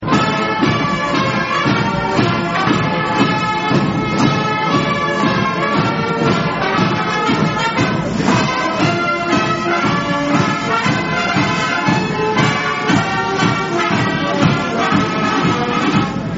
short instrumental

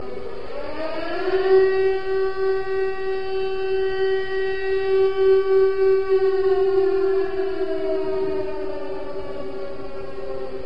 air raid

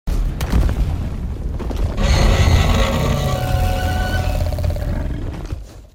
roar small; alatreon

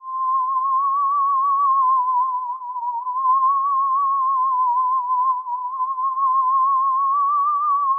ufo flying

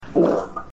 splat

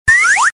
whoep

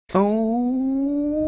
oooo cat

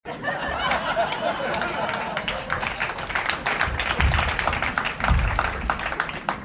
laugh

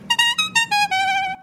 trumpet; glaggleland

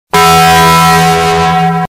loud bell; taco bell

